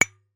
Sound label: foley lightbulbs x2 bang together